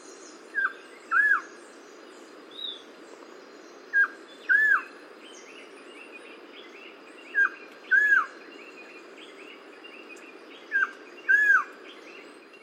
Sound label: Quetzal crestado Pharomachrus antisianus